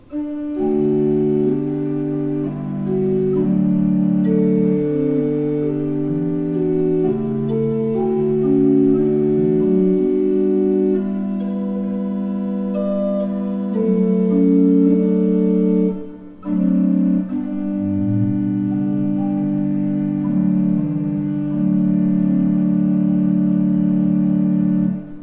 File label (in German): Vermeulen 5 I P, orgel